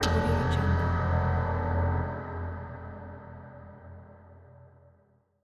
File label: light horror piano cinematic impact